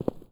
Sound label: Footstep Stone Medium